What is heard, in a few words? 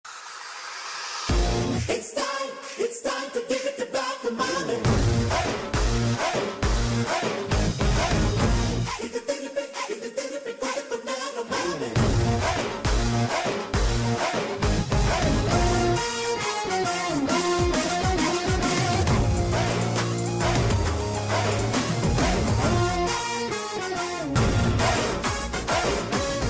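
climax background music ringtone; varisu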